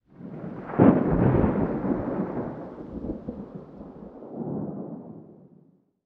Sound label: ambient battle; World Of Warships